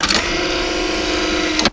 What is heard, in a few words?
DOOR OP; Messiah; GUNTURRET